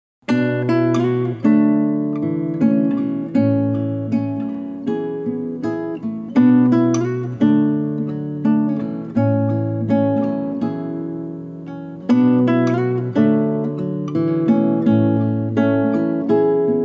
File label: water alt inst